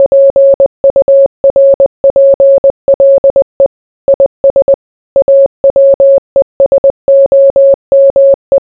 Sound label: morse